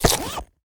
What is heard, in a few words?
war ui inventory backpack small item pickup